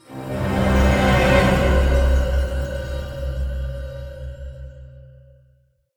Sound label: Player Eliminated Stinger; Fortnite